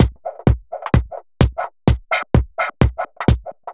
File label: Beat, uk garage